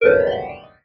Multimedia Internet CD-Rom Flash Animation Musical